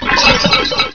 Nova Appears; Maelstrom 1.3

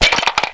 ceramic break; ceramic break1; bugbook

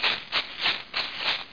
walk; Ghandi

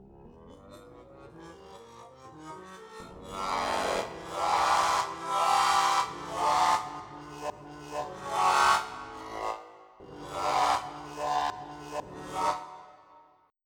Octave Growls, tromwahm; SoundFont - Traditional, Voice & Keys - Volume 2

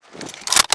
kar sniper reload end, weapons foley; Spearhead Demo; MacFormat 2003 June

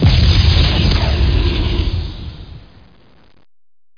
AIR BLAST

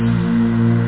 strings; Amiga 8-bit Sampled Voice

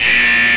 BUZZ; Free Desktop Timer

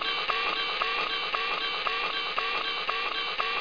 legs run

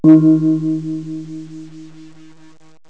thai gong

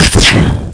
points zap; Escape Beyond 2001 v1.0

